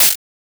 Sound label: Clap Snare